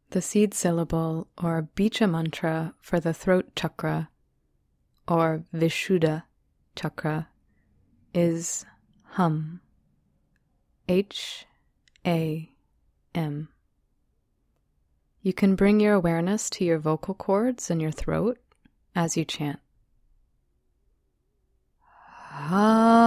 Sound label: Ham Throat Chakra